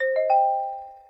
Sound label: rear right, surround; svencoop